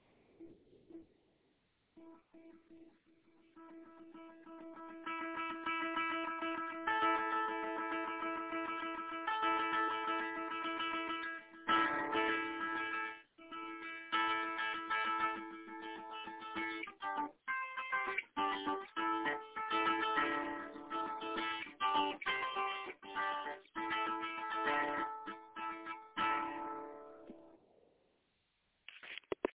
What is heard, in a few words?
fading into awareness